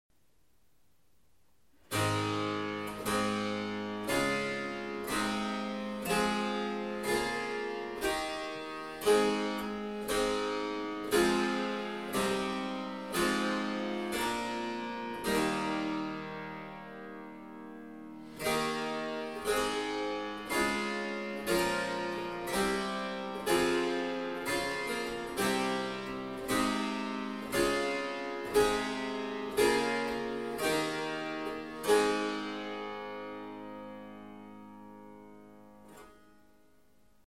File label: harpsichord